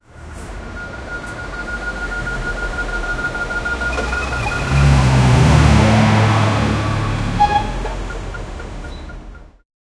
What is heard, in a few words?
pickup truck moving fast